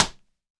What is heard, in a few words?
tap, handy percussion